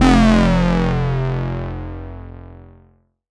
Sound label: bass F1, ARP Odyssey; Polyend; Simulacrum Sounds; 1 Shots; Synths